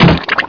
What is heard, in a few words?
flesh